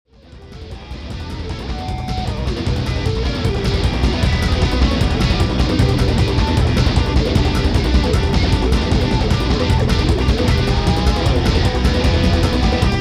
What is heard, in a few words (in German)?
Technotronik